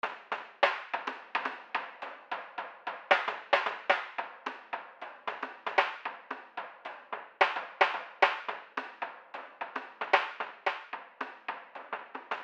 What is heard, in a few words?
drum, equalizer plugin; FabFilter Pro-Q 2